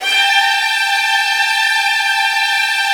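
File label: Virtuoso Strings, violin trills, G3; ILIO; Kirk Hunter Virtuoso Strings VOL-1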